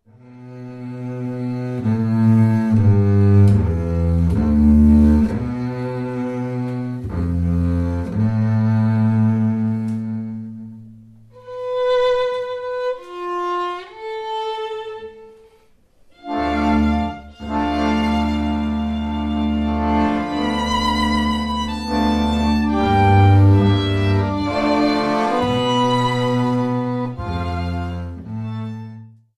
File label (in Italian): Larghetto